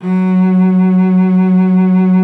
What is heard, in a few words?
F#3, cello vib; Xsample VOL-7